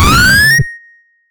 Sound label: jump video game; gameplay